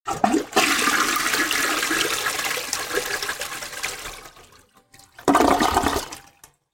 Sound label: toilet msg, funny